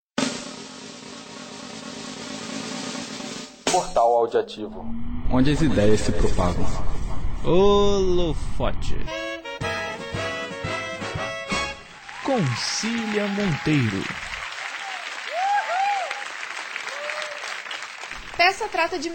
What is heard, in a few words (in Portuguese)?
toque